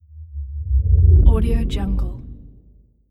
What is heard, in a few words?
whoosh